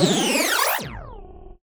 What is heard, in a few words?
Misc Synth FX; musicradar; cartoon caper